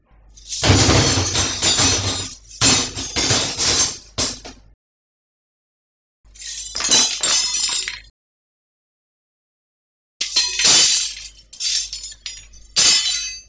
cutlery crashes

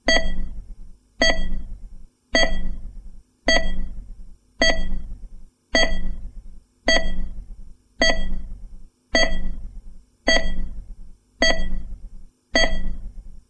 monitor beep

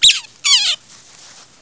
otter

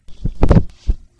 faint fall

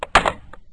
put down object

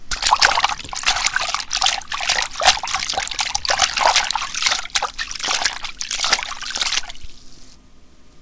splash about

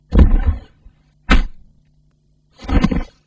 body falls

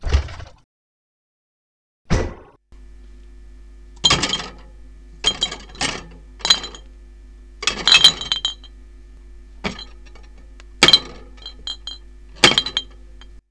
fridge door

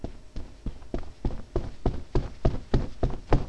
steps

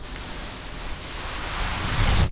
slide

